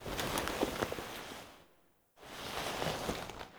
Foley Operator Look Up; Warframe; Orbiter Cradle

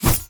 Melee Block Start; Warframe; Weapons

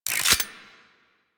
Hand Shotgun Reload

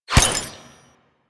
Glass Shatter Damage Hit; DE; Warframe

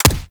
Weapon Punch Rifle

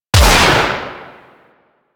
Heavy Shotgun Alt Shot; Weapons; Warframe; Tenno

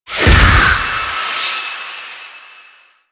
Healer Appear; Warframe; Infested; Arlo Devoted

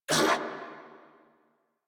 Pain, Female; en; Warframe; New War; Narmer Grineer

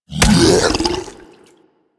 Tendrils Digest; Warframe; Warframes

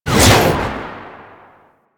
Jet Turbine Pistol Secondary Shot Release; Warframe; Tenno

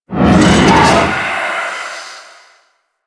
Spark Grenade Start; Enemies; Sentient Stalker

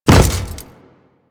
Step Default, Footsteps; Warframe; Grineer

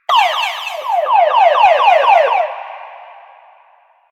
Car Alarm Destroy; Ambience; Props; Warframe; NineteenNinetyNine

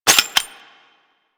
Ammo Pickup; UI; Pickups; Warframe

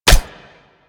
Shrapnel Shotgun Mech; Railjack

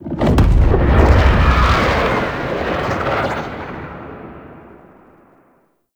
Distant Cannon Fire; Makvoss; Warframe; Tau

